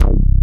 low end bass; Masterbits; Dance Trax

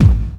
BD, Dance Mega Drums; Best Service

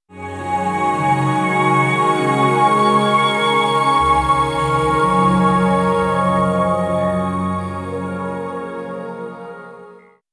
Hi Complex, Complex Wave; Sonic Foundry; Sony Creative Software; James Johnson Spektral Minimalism

